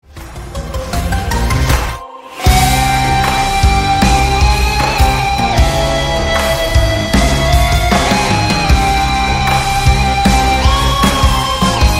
music brawl round start 95bpm; Risk of Rain music pack; in-game music

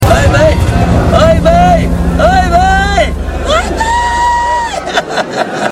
music idol return opponent; Hebrew urn pack

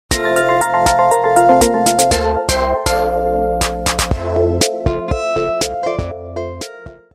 music idol return team; Omori sound replacements

